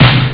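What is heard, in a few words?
snare drum; Amiga Soundtracker sample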